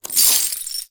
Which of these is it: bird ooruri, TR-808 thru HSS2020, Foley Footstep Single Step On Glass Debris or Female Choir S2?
Foley Footstep Single Step On Glass Debris